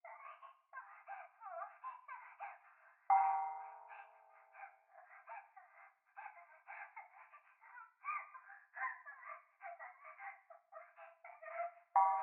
風呂 可愛い喘ぎ 寺, ASMR

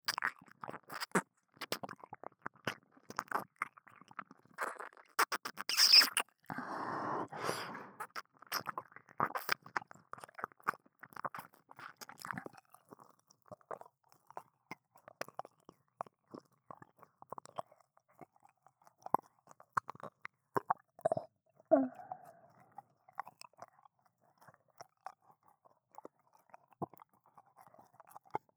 左耳舐め, ASMR

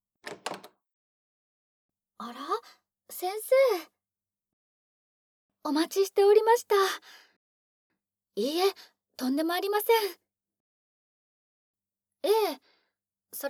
誘惑ASMR指舐め＆乳首舐めご奉仕; SEあり

